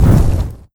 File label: flamethrower end